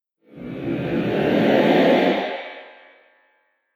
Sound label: Production Whoosh; Andrew Fleming